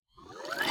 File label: Wires Power-Off